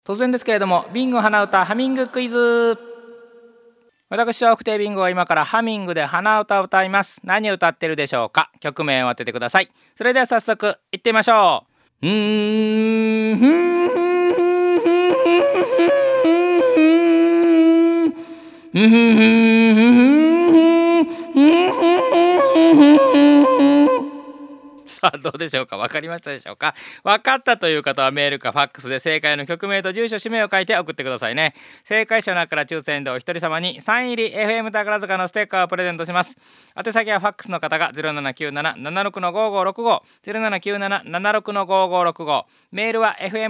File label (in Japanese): hanauta